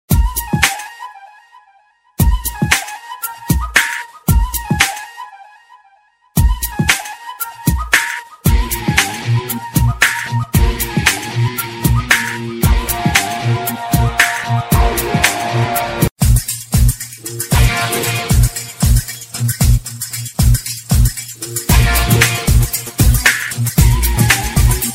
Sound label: remix instrumental ringtone